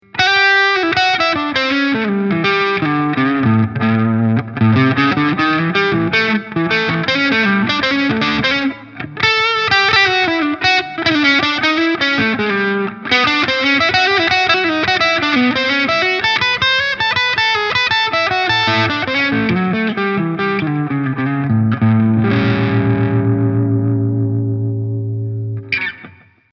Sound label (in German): Maybach JazPole 63 Hals verzerrt